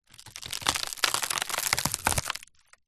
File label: wood board large stress break splinter; foley